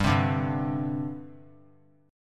G Suspended 4th; Gsus4 strummed; JGuitar

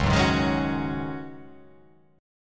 C sharp Suspended 2nd Suspended 4th, C sharp

